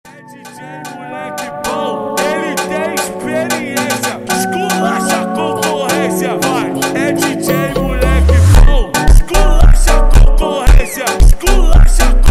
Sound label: Funk super Slowed